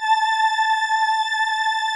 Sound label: VP-330, String Machines